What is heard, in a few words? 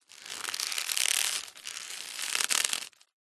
rope old stress creak sweatener; foley; zapsplat